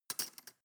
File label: cutlery teaspoon drawer place